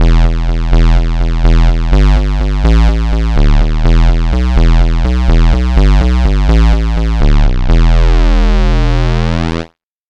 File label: square lead bass